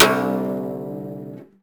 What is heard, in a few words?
Cello Hit String Snap; SFX Kit; Foley - Misc